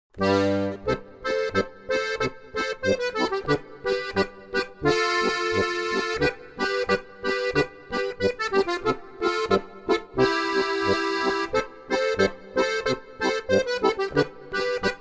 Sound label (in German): Zillertaler Hochzeitsmarsch